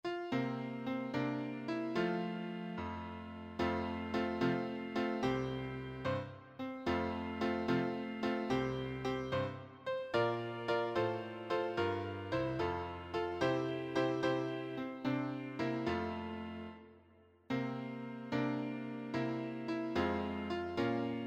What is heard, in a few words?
piano acc; church and sunday school music; Hymns